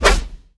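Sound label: attack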